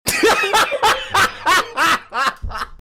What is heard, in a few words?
laughing